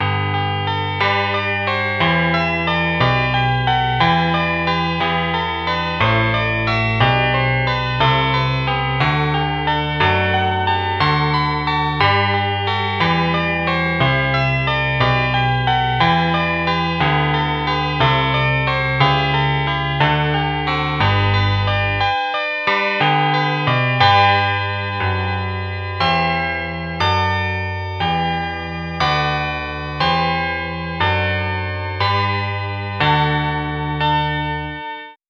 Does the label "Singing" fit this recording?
No